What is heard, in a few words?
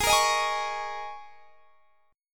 Ab Suspended 2nd Suspended 4th; Absus2sus4; strummed; chordshape